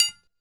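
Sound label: pipe drum; ILIO - Ethno Techno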